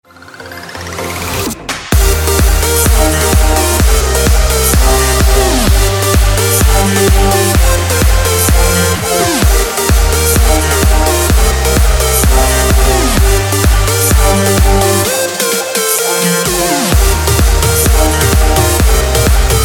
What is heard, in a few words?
alarm extended mix